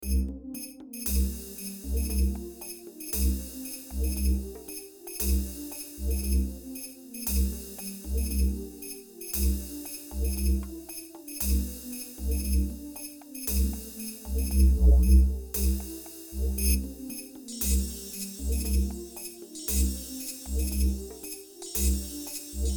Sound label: drums